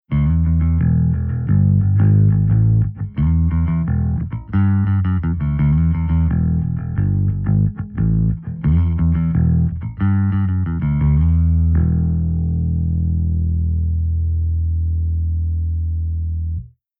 ASH VALVE DRIVE

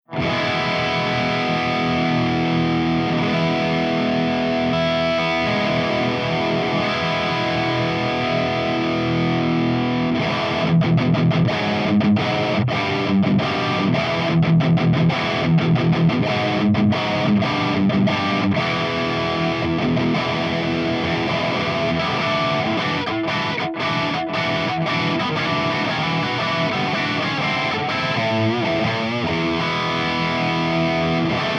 ENGL SP CH4 HIGH GAIN V30 P90

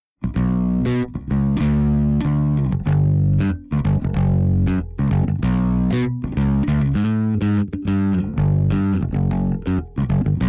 PSA1 STANDARD

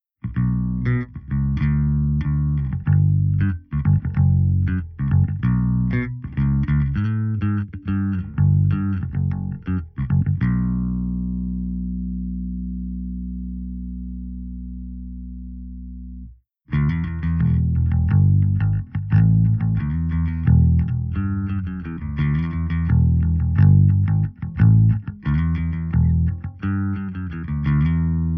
SVT LOW HIGH

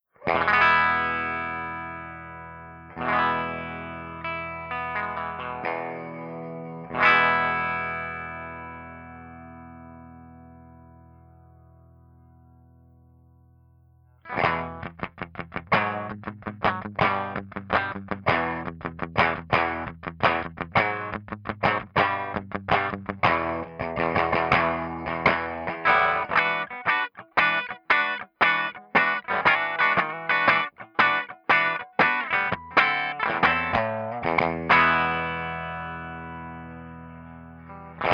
FENDERTWIN BRIGHT HB

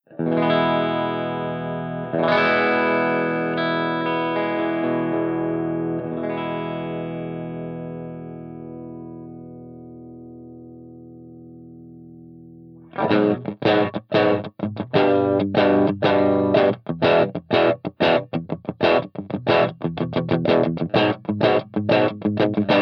ROCKERVERB CH1 CLEAN V30

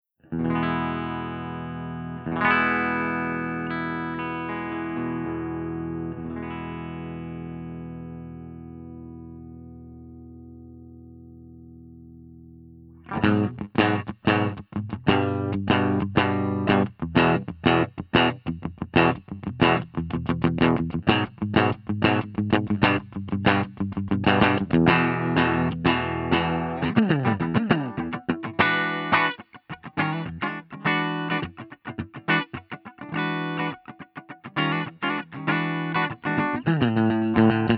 FENDER75 STANDARD SC

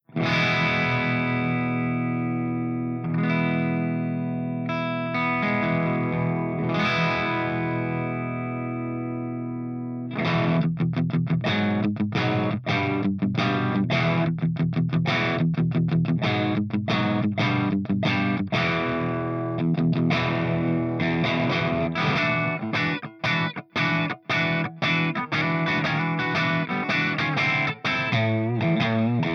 PEAVEY STANDARD P90

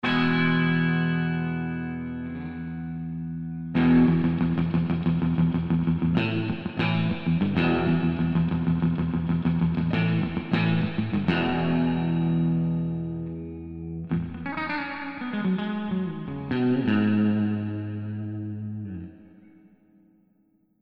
FENDER SS Warm

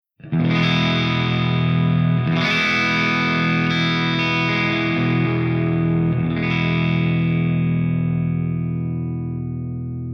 HIWATT HIGHDRIVE

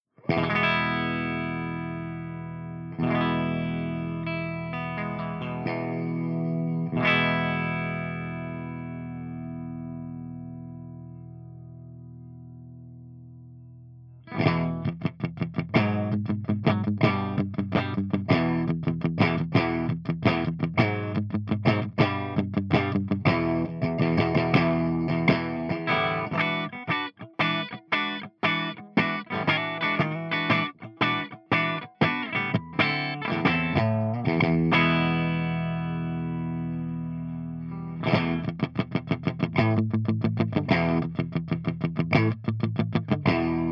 ENGL SOV CH1 CLEAN V30 HB

